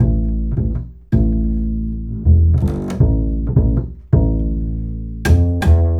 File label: Acoustic Bass; Sonic Foundry; Leo Cavallo Bass Taster